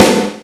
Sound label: KGT1, Korg-T1, Drums